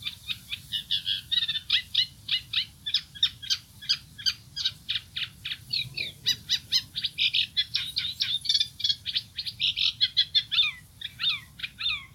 Rytikerttunen; kuinka rytikerttusta huijataan; anna tuominen

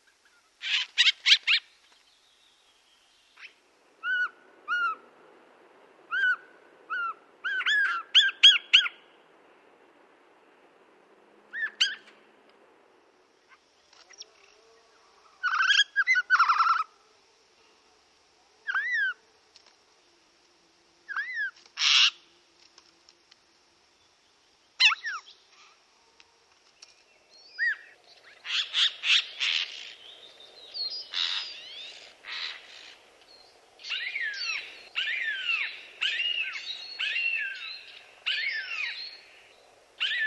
kuukkeli; janerikbruun

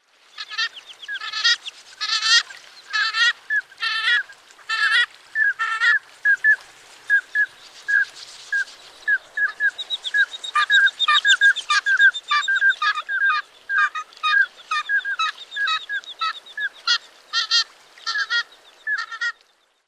mustalintu; arktikan aanet; Jan-Erik Bruun

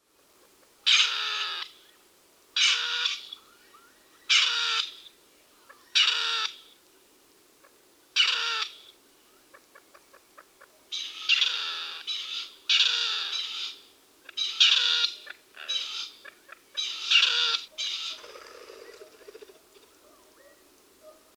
peltopyy, soidinhuutelu

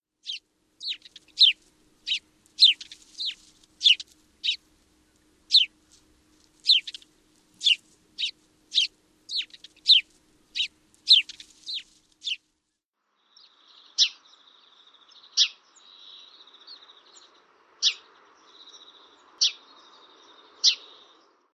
Varpunen; varpusen ja pikkuvarpusen

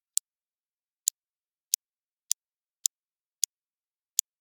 suosirkka

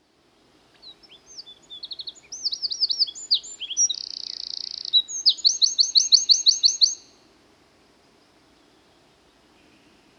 peukaloinen

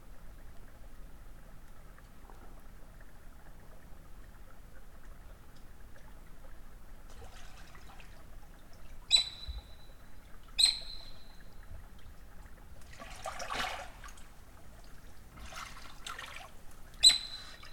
saukko; sulapaikan liepeilta; lauri hallikainen

